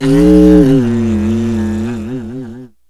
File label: PITCH ADD, Techno Trance; E-MU Formula 4000 Series Vol. 2